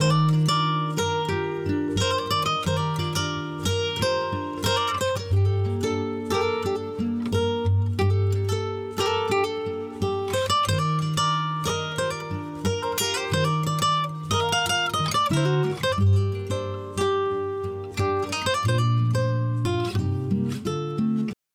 GUITAR COMPRESSED

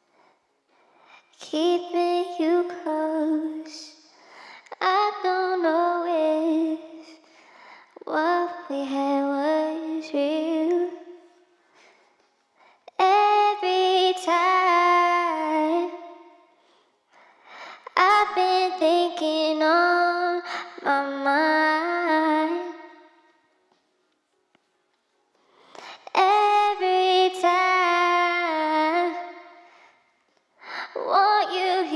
VOCAL DRY

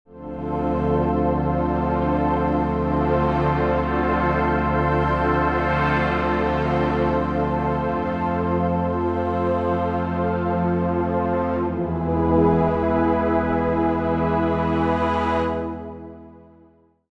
wavetable pad; ableton live tutorial

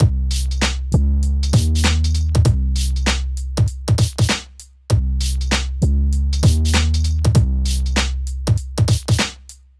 808 synthesis with analog

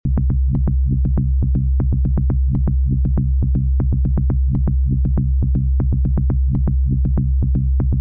modulation loops

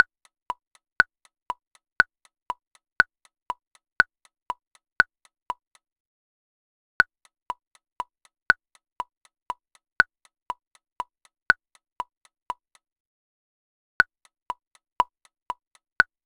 Simple Meter 120bpm

